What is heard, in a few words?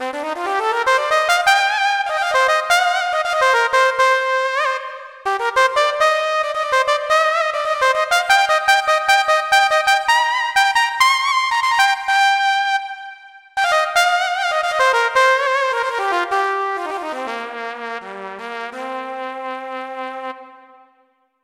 Trumpet; KORG OASYS; The Golden Trumpet; oasys assault